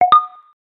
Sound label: Notification; Realme Narzo 60X